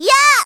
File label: zelda fire; SSB Melee